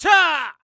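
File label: Mon Man ATTACK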